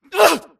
grunt death neck; Titanfall 2

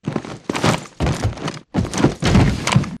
bt pilot embark casual right; Titanfall 2

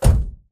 footstep jog; Titanfall 2

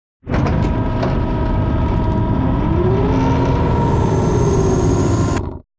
Giant Floor Panel Assemble Final Lift Servo; Boomtown; Sideways Town; Titanfall 2

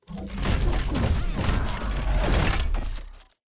tackle setup; bt; viper; Titanfall 2

